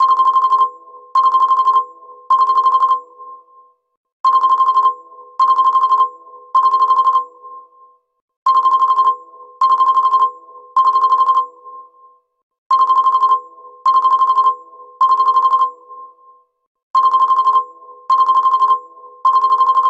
Beep; LG; P940 Prada 3.0

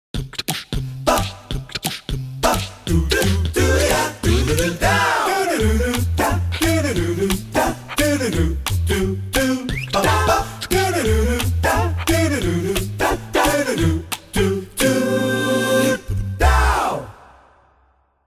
Nokia Cappella; Windows Phone apps

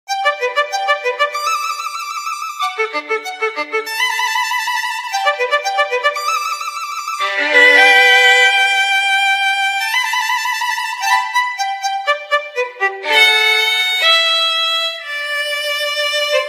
Trillo; Samsung SCH-i400 Continuum; ringtones

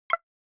camera click; China Telecom

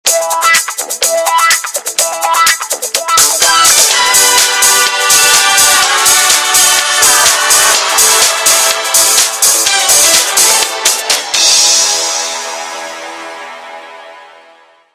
best of disco; Motorola EX300

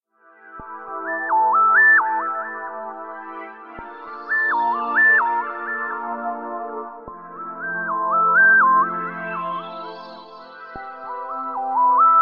Morning, alarms; Alcatel; OneTouch-S'Pop-4030D

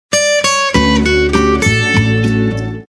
Bossa; LG GW820 eXpo

